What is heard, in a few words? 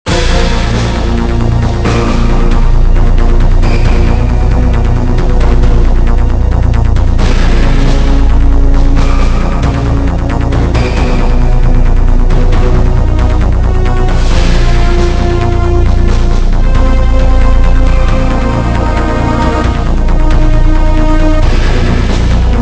suspense; svencoop; kcastle